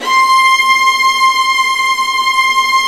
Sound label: STR VA, String Sections, Violas, Bright; Roland LCDP13